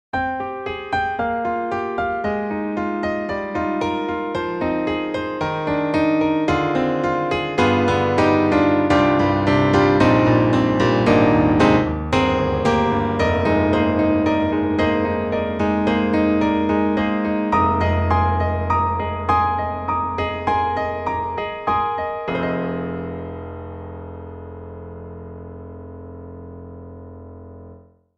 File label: Piano Amber Studio; 4Front; Truepianos